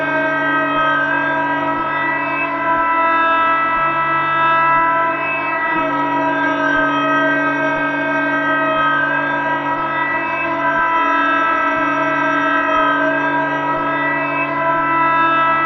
alien guardian pod loop

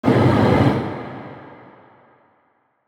brain Hit; Thalamus; CARRIER

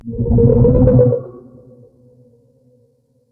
MOLOCH attack

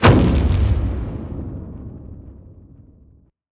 tongue Hit; Latcher

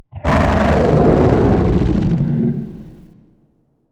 ENDWORM idle

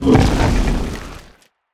attack; Barotrauma; Latcher

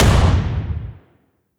Stun Grenade; Weapons

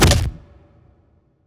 Hit Armor; Damage

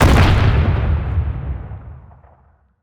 Railgun; Weapons

